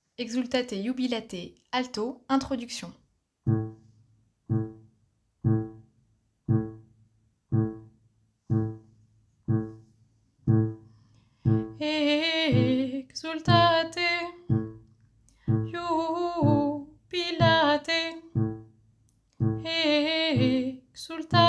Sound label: alto; exultate